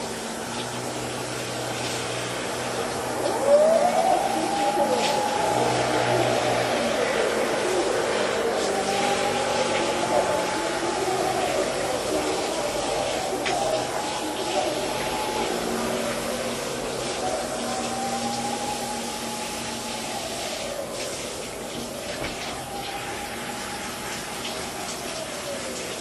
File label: bath siren